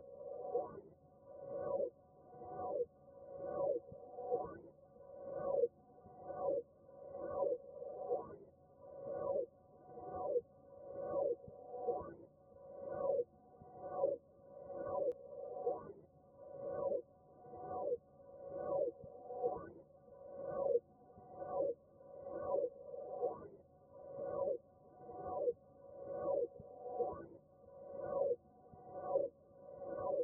127 BPM B Min Texture; Cymatics; Nightmares Trap Melody Loops; Spirits

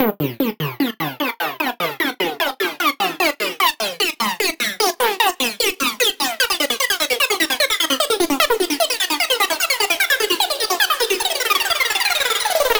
Riser

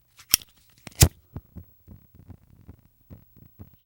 Zippo Lighter Flick Wet; Fairlight Sound Lib

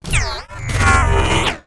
wooshes transform sweetener

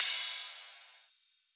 Tip Ride, Cymbals; House; Drum One Shots; Cymatics

